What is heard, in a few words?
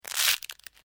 DRY BRITTLE SNAPPING; Various Organic Foley sounds; Human